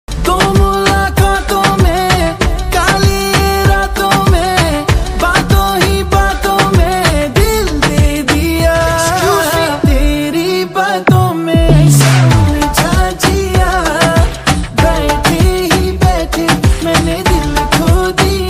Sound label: new hindi ringtone love song ringtone